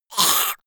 monster choking high; koerpergeraeusche; comedy-cartoon; soundpool

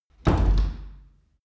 wood door old hard

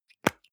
deo can lid open hard

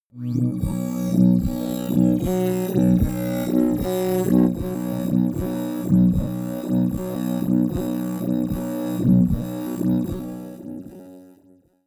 drone bass bubble; musikelemente; drones

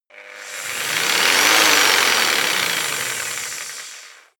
jigsaw engine medium fast short; maschinen; saege

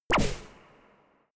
space laser gun bubble shot; krieg-waffen; soundpool

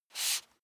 step barefoot wood parquet slide short; Footsteps; schritte

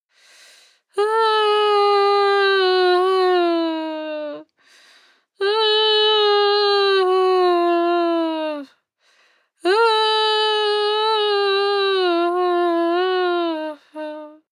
female moan whine soft sequence; soundpool

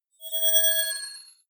hologram appear, energie

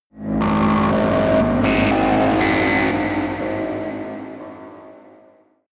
sci-fi cosmic strong dark energy, horror

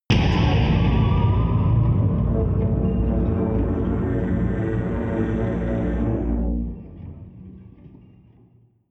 drum eerie synth, drones

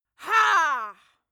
female cheer; schreie; menschen

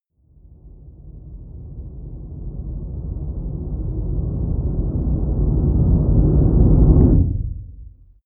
deep noise synth rumble short, Risers; whooshes rises

